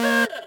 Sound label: Countdown Beep; The Loud House - Extreme Cardboard Racing; NextlevelGames